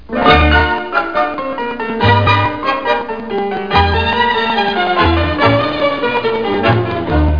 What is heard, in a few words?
Tango; Adoption MacroWav Library